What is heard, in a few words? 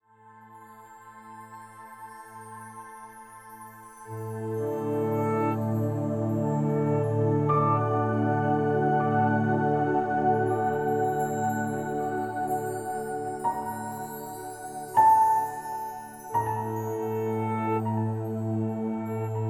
Jingle Bells